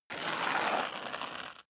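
step high heels concrete grit slide long, footsteps; schritte; menschen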